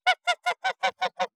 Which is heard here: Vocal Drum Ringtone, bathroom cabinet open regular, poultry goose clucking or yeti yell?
poultry goose clucking